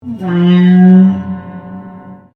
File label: Piano Upright Broken String Slide Down Rubber